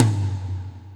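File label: Tom Mid Wet; Alesis D4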